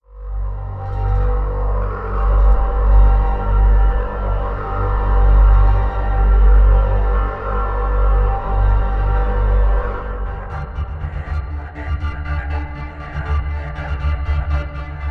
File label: Gravity Pad B Motion